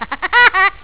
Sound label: HAHAHA